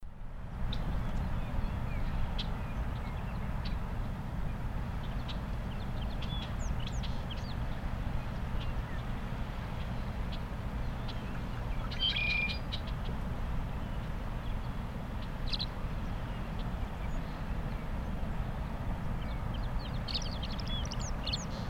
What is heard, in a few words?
Tree Swallow Tachycineta bicolor; BC